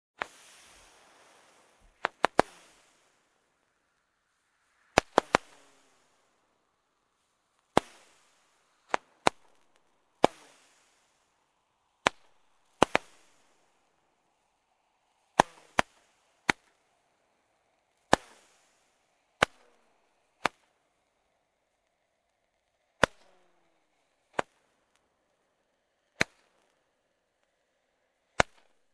Fireworks being set off

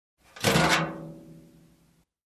Metal Trash Can Lid

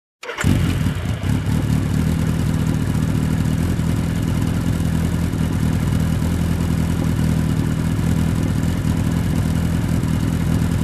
Heavy Motorcycle engine running idle

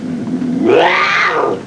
Jungle lynx roar

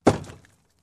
Object hit

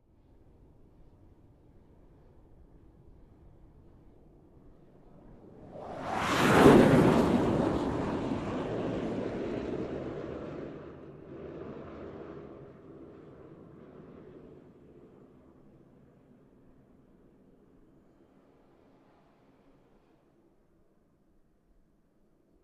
Supersonic Aircraft Whoosh

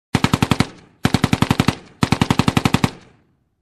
Machine gun firing in intervals

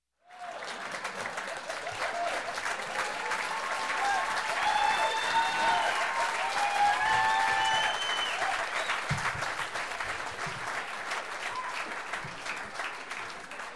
Audience in comedy show laugh and cheers

